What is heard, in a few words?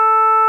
timbre